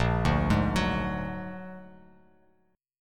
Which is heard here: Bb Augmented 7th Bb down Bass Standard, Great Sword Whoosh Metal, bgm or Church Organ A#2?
Bb Augmented 7th Bb down Bass Standard